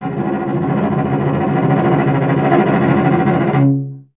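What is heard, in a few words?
strings cello C#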